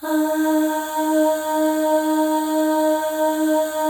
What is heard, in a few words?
AH FLAIR, FEM; Spectrasonics; FEM.2 AHS